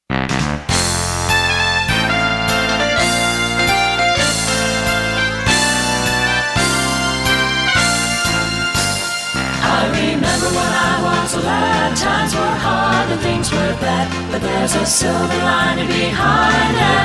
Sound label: SATB; sacred